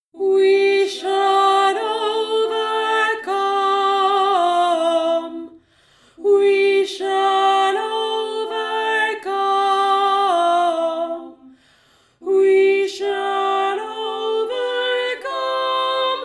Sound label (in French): VOIX 1; WE SHALL OVERCOME